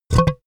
toilet bowl fart wet; koerpergeraeusche; menschen; soundpool

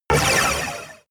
sci-fi laser shot long; krieg-waffen; soundpool

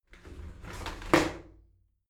shower slide doors open soft; objekte; tueren

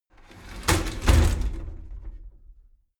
shower slide door inside close heavy

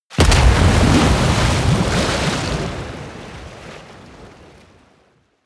explosion large water bubble, comedy cartoon; soundpool

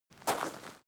step gravel single; schritte; menschen

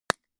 gun shot silencer medium; schusswaffen

